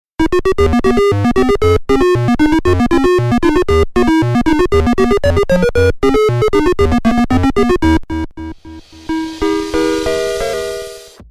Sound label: GAME RINGTONE, INSTRUMENTAL; CRAZZY MONKY